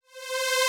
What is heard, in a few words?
Future Bass Lead Synth Reverse